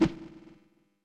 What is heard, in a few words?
Small Whiff; Super Smash Bros